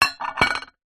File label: household metal pan set down on plate